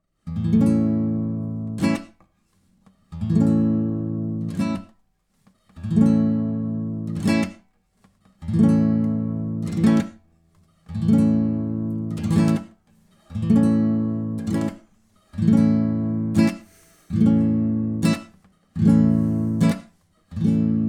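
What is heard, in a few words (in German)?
Der Wrumm-Tschack-Anschlag, Gitarrenbegleitung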